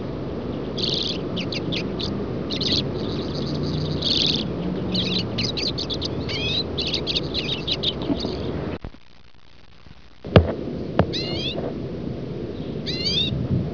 common redpoll

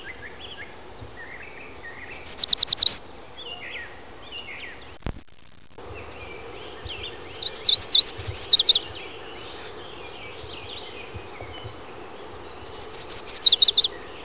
yellow warbler

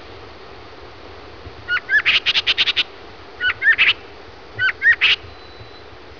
gray jay

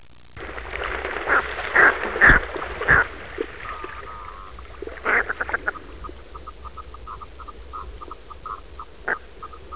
common merganser

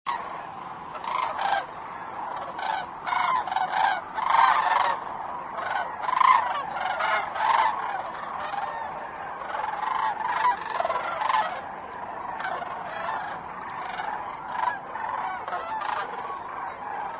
cranes

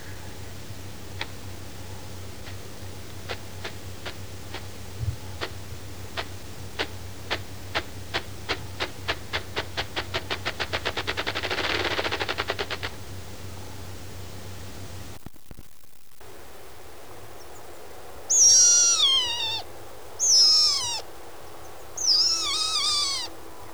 ruffed grouse

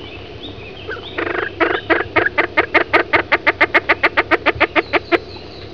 spruce grouse

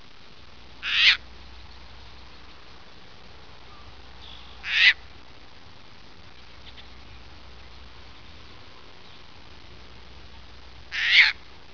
caspian tern

